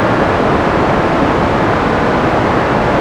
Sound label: NOISE